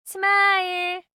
voice sound smile; LG V20

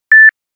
Beeper; Nokia Alerts; Windows Phone apps

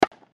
bounce

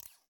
Video Stop, ui

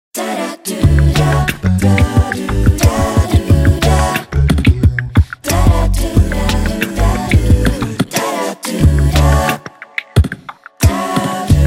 Lounge, Nokia Tones

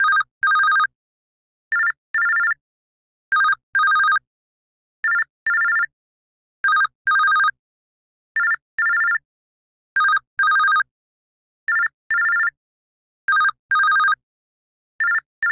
Simple Bell

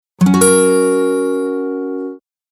Guitar; BlindShell; BlindShell-Classic-2

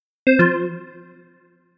Power Up

